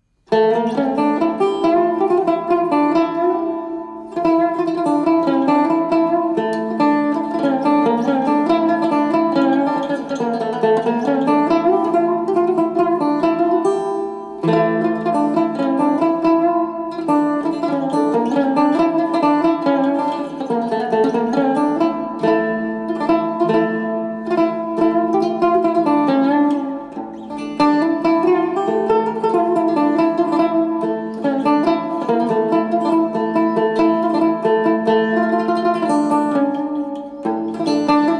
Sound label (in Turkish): cumbus solo fretless banjo; Nazende Sevgilim